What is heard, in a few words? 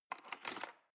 cutlery teaspoon drawer scrape